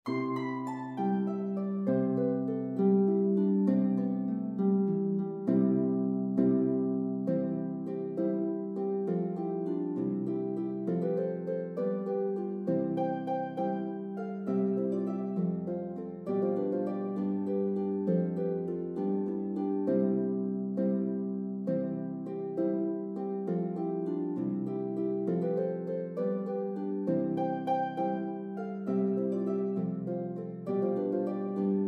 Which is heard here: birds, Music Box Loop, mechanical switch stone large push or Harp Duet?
Harp Duet